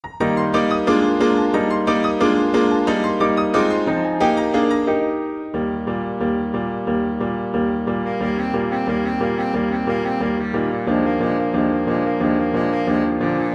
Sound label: baritone saxophone